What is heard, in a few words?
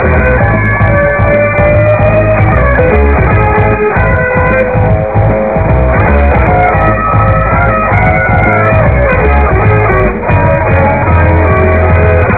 crazy car loop